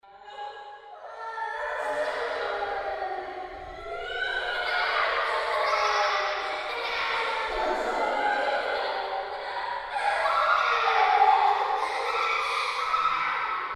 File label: panic; Sprunki SecretHorror; horrorsprunkiqip